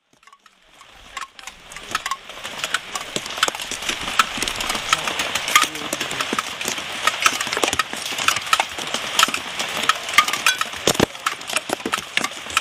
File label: koenji rain; field recordings; slater